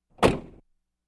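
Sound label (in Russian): дверца автомобиля закрывается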